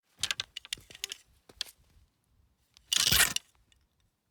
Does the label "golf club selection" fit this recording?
Yes